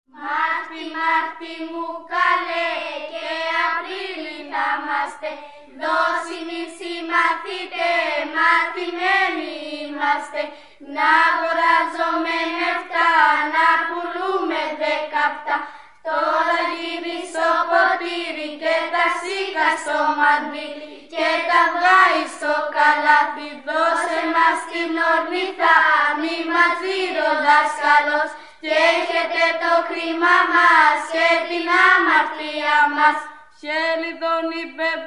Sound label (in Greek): chelidonismata; dodekanisa; chalki